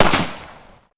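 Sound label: sniper fire distant; combine sniper; hl2 weapon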